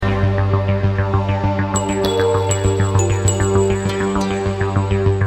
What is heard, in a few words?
ringtone